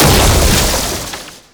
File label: Stalker Jade Scythe Proj Die; Warframe; Weapons